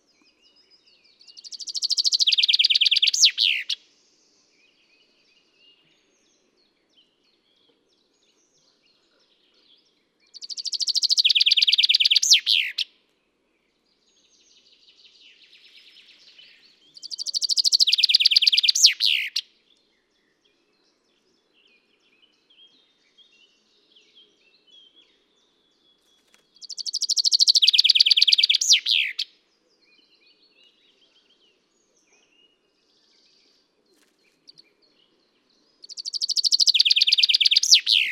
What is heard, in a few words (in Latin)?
Fringilla coelebs; SETE